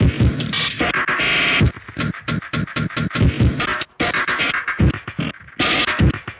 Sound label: crazy glitch drummer, drum loops and breaks; 141 to 160 bpm